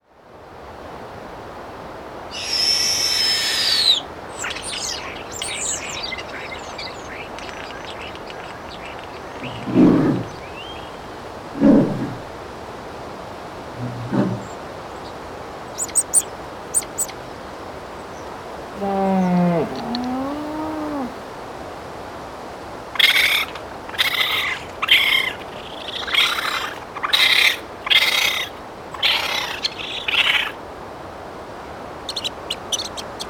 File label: sasquatch soundscape